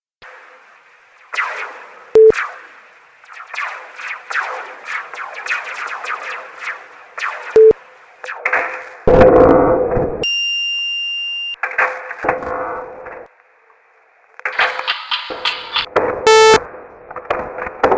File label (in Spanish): Paisaje sonoro